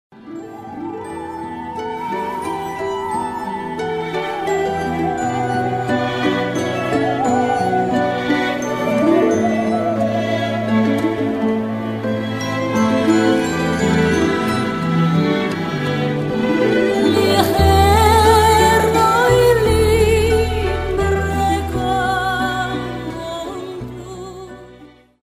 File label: Waltz